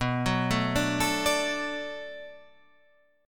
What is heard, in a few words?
D Major B, guitar; D/B arpeggiated